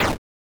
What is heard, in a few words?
8bit gunloop explosion